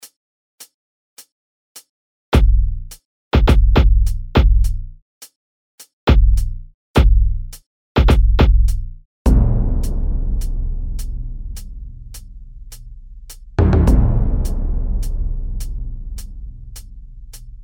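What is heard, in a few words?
Punch Bass Drum Special tuned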